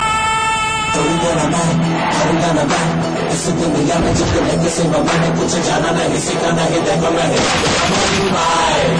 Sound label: bgm